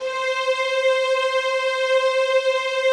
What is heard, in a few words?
PAD, DIGI-PAD